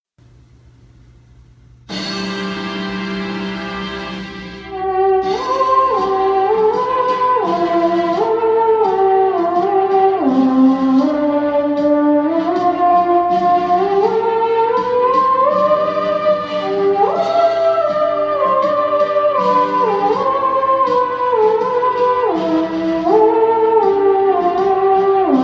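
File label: theremin; Гимн России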